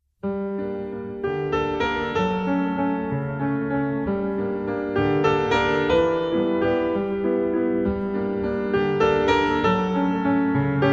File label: Waltz